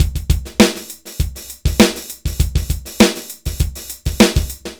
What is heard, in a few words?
dry loop, BPM100